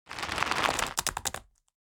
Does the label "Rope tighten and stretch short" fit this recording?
Yes